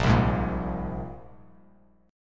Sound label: Bb 7th Suspended 2nd, chord; 6,3,6,3; Bb7sus2 strummed; JGuitar